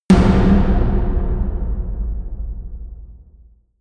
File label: huge tom hits; music elements; percussion